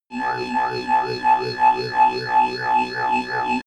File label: spaceship alarm